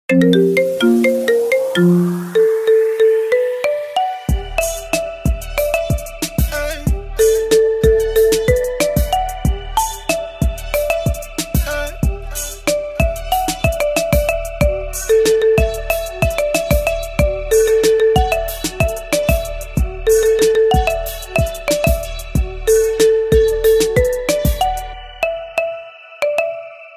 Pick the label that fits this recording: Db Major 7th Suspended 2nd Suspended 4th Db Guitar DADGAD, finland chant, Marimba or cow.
Marimba